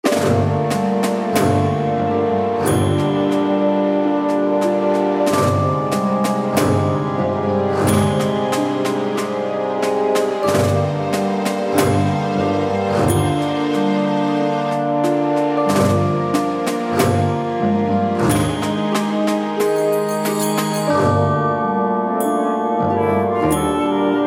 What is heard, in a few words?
Tension Alternate Version